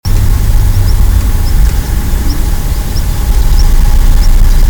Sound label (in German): schwarzkehlchen